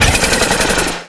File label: turbo blowoff; gmod; tdmcars